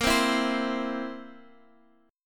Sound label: A sharp Suspended 2nd Flat 5th, down, Guitar, Half Step Down